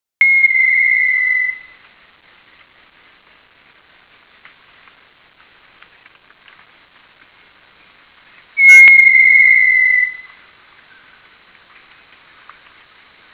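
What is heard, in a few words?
little tinamou, birds on the Costa Maya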